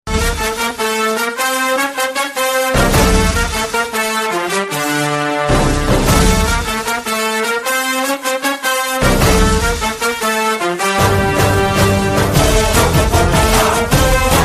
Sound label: Bgm Tamil Ringtone; Namma Veettu Pillai